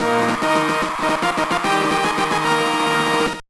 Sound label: 2nd Place; Nicktoons Racing; gba